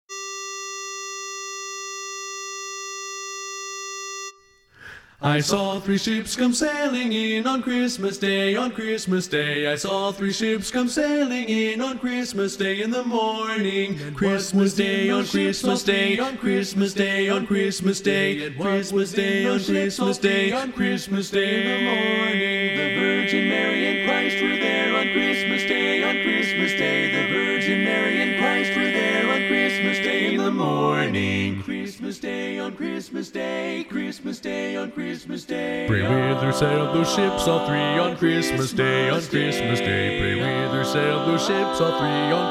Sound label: Bass, Learning Tracks